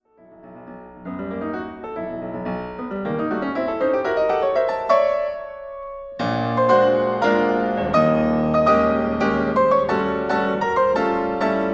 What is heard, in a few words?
Mazurka op 50 no 14 ending